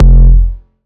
Bass F, Bass Synth

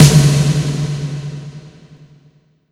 Electronic Snare reverb; Yamaha PSR E305

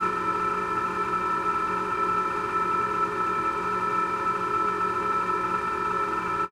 pad; M8

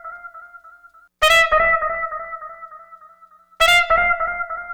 Trumpet; Sonic Foundry (Sony Creative Software); Stylus Pressure Urban Grooves on Digital Wax

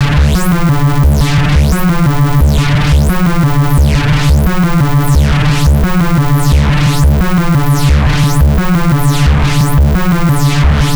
Runnin Bass; AMG - Prototype Drum & Bass

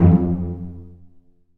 Cel Pz F4; Digital Sound Factory Virtuoso

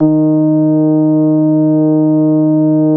SLAP D#1, Bass; E-MU Producer Series Vol. 6 – Analogia Project (CD 1); Boom da Bass 1

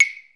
CLAVE; DRUMS & PERC

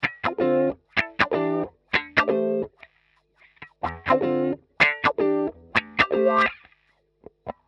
125 wah 3 chords D E A, wahwah; PowerFX - Nu Jazz House

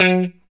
POP F#3, STRAT guitar; Spectrasonics; Hans Zimmer Guitars VOL-1; JC-STRAT POP

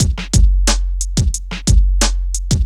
90 DRUMS

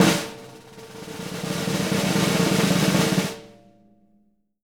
snare roll, JZ XSNARES; Sampleheads; Peter Erskine Living Drums

